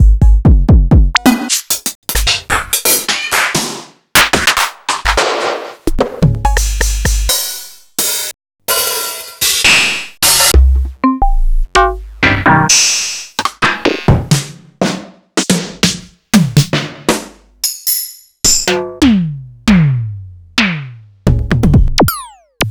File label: Jomox Xbase888